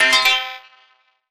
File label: Rogue Alert; Sony Ericsson; W508; PlusFourSix; Brittany; Lars Edvall